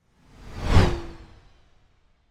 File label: Space Ship By - Extreme Fast Passing; Hollywood Edge; sound designer's toolkit v2